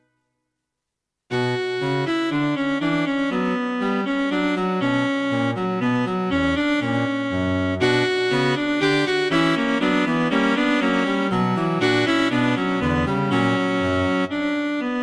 Cello Trio; 2 - Allegro in 3/4.; A suit of three Pieces.

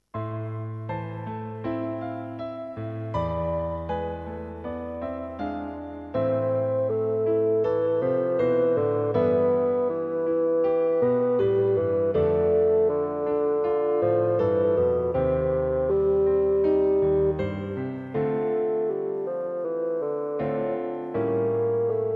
Bassoon and Piano; many running passages, they should not be too hurried.

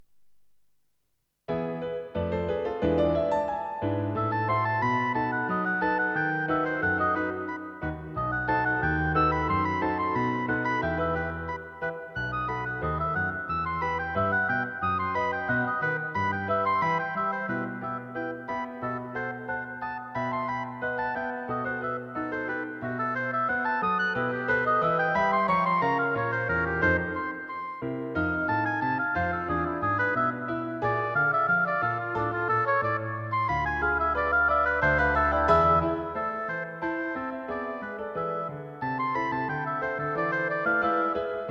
Oboe and Piano; Oboe Solos; Written in a modern style.; Suitable for players with a grade of five or higher.

